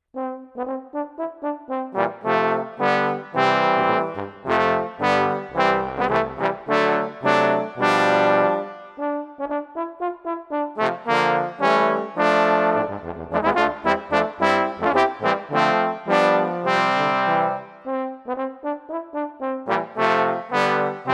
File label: Trombone